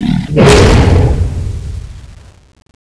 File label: skill shoot, zombi; aksha zombi